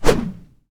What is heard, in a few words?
throw javelin; Mount&Blade Warband